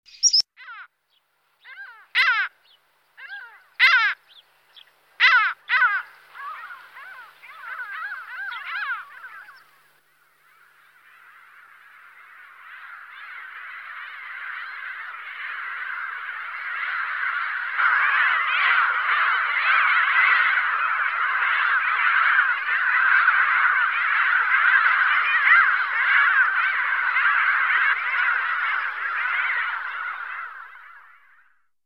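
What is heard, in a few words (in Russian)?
chernogolovaya chayka golos, Mediterranean gull voice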